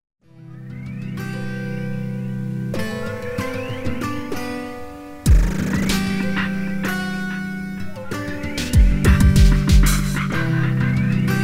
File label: acoustic guitar